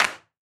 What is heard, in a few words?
clap